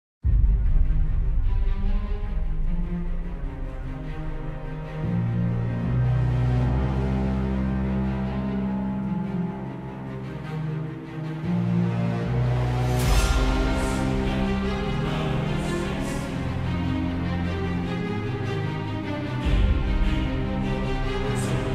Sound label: Epic Hybrid Orchestral